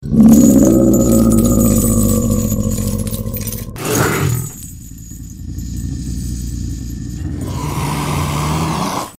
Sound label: boss rock; Mauya